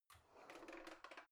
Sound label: old drawer creaky soft half out